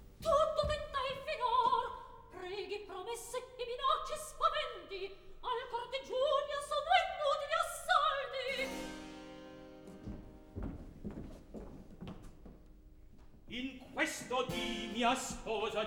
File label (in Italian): Atto Secondo Recitativo; Celia, Silla; Mozart; Operas; KV 135 Lucio Silla - 1772